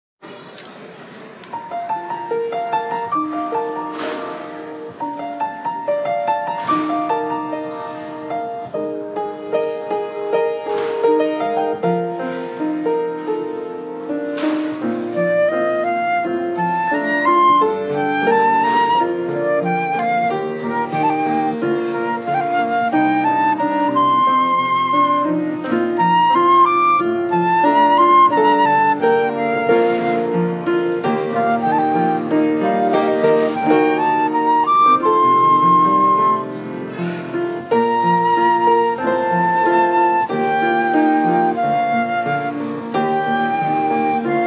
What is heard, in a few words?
theme song descant flute